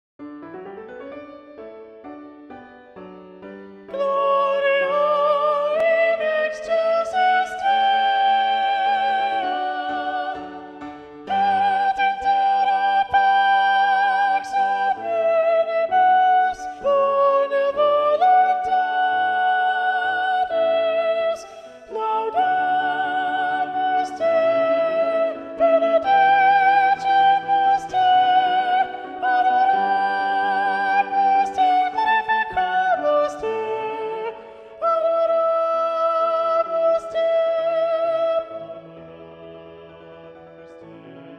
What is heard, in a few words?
Mass No2 in G Gloria Soprano